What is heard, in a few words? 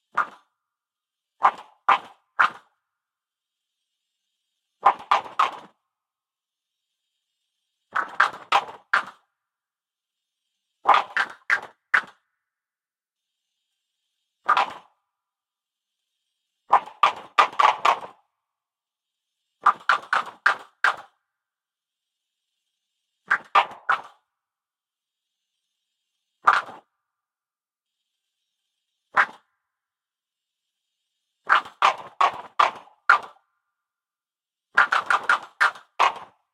voice breathing loop